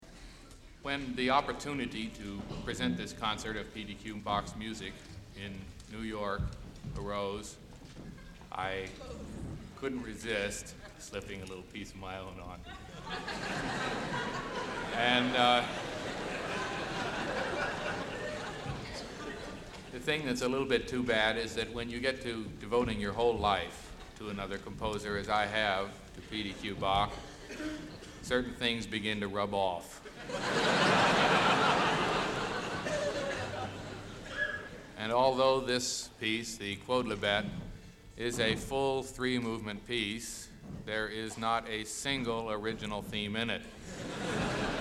Quodlibet for Small Orchestra; Introduction

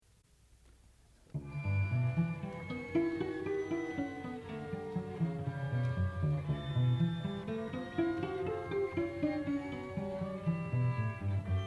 String Quartet; String Quartet No. 3, Mosaic, II.

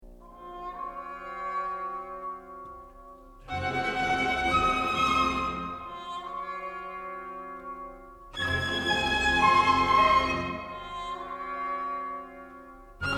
Andante sostenuto, quasi ostinato

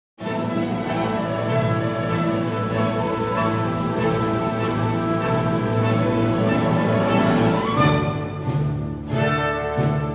Rustic Dance; German; Merrie England

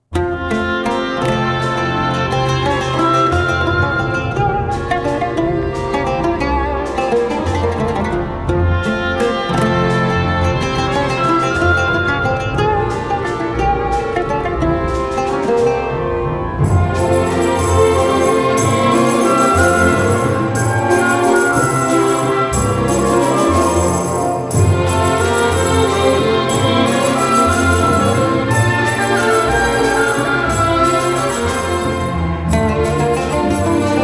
Waltz; Zangeran

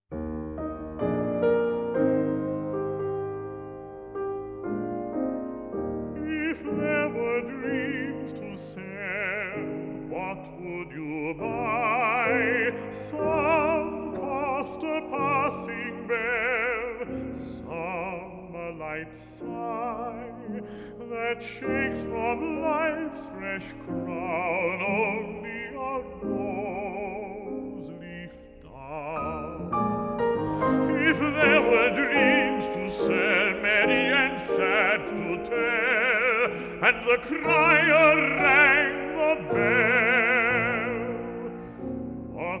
For Voice And Piano; If There Were Dreams To Sell; 1918

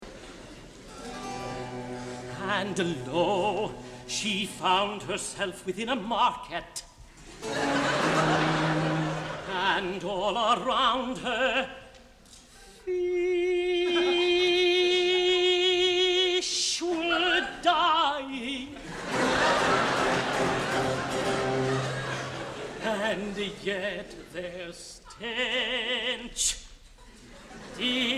Recitative

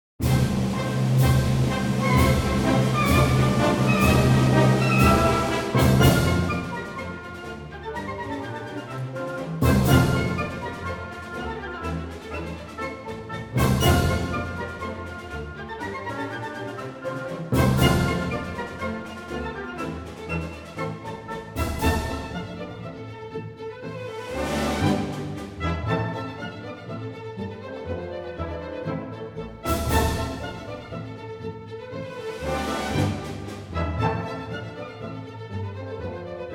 Merrie England - Hornpipe; German

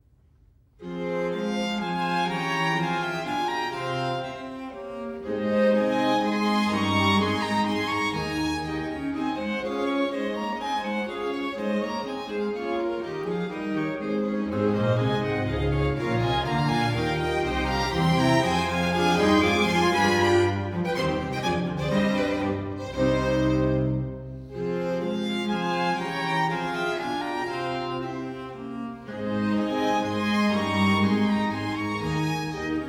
Largo andante, Adagio

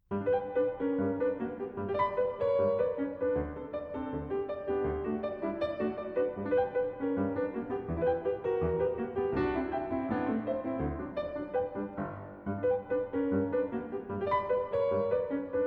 Etude In G Major; Kjerulf

